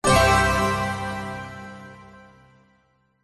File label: multimedia game sound happy bright warm complete tone